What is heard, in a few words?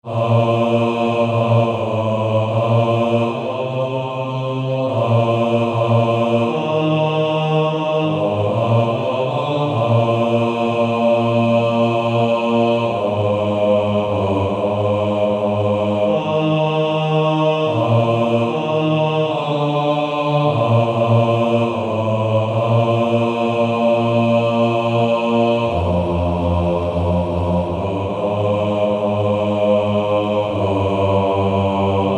Male Choir; Lead Me Gently Home Father